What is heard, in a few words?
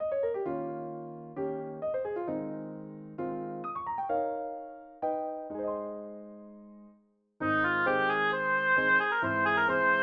Maiden must bide for oboe; Oboe, piano; synth